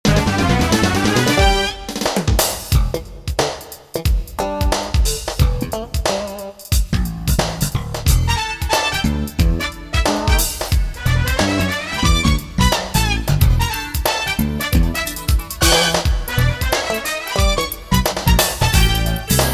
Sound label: korg wavestation sr factory demo all songs; Korg Wavestation SR Pure